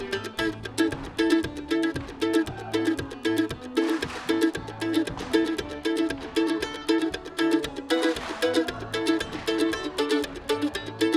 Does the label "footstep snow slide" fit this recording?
No